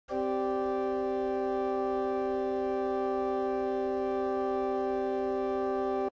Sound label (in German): C gleichschwebend